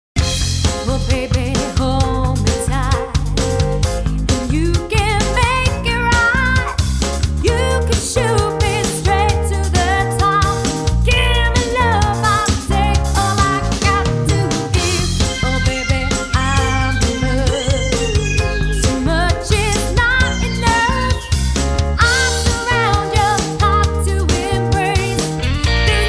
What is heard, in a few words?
80s Hit Songs; 80s Retro Show; Sing Out Sisters